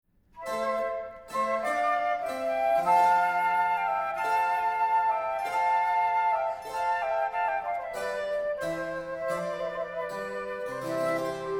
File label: Sonata for two treble instruments